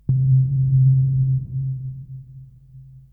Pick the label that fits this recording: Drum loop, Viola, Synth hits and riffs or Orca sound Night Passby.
Synth hits and riffs